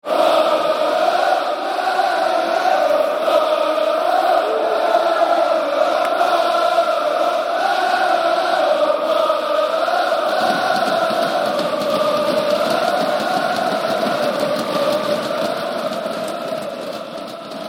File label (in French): lalalalala, chants; football; psg